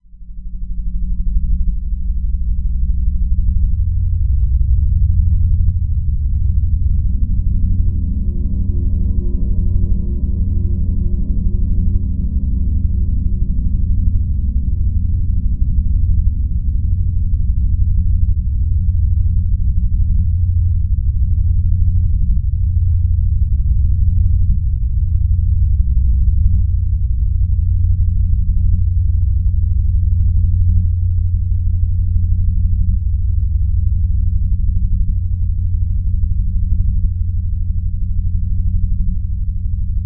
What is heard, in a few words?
Drone Bed Pulsing; Production Element Imaging; AdobeCC